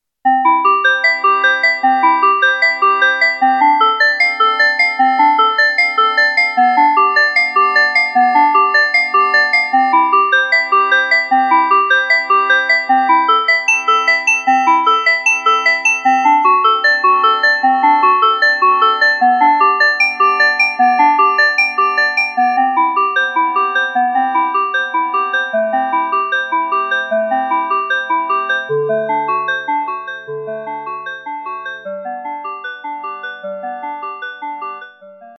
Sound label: synth; bach